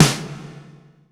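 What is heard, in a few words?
REV SNARE; Greytsounds; Scott Peers Sound Engineering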